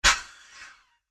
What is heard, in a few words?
Pneumatic Release, Scifi; Science, Fiction; Spacecrafts